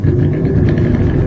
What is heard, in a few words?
fan activate; factory